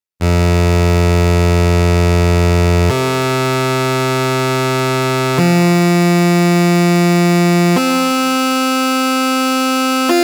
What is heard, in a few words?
Saw